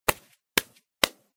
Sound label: foley hit; rockpaper; fortnite emotes